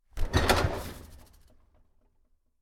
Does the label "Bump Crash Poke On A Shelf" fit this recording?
Yes